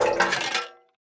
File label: extra tank; milebymile